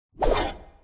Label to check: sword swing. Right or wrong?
Right